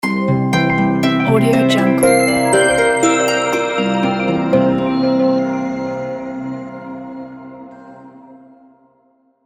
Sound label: elegant logo